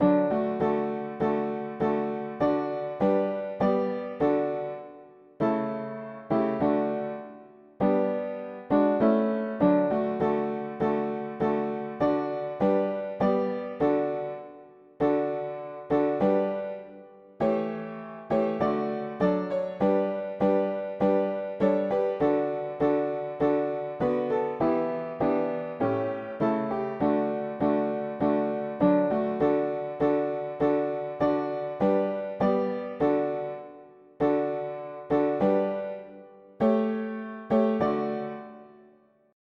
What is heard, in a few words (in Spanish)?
mono piano; hymns; Himnario pequeno 2Pte